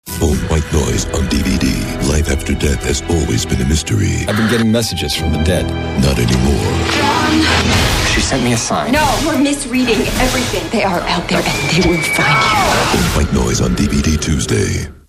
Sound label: whitenoise